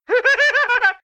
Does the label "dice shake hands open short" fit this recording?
No